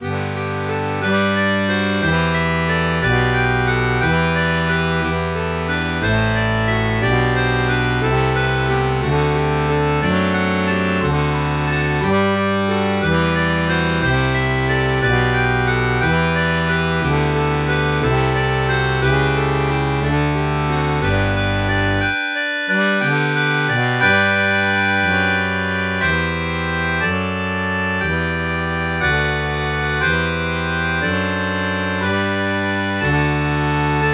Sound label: harmonica